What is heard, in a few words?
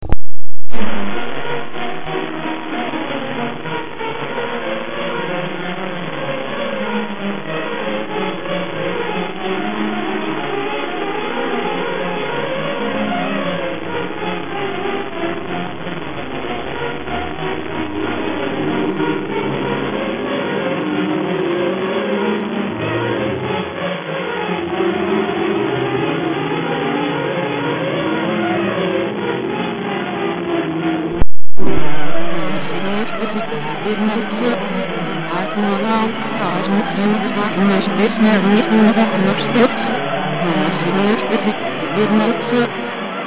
fuga